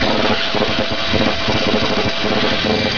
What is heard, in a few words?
arc, Electric sounds; 1001 Sound Effects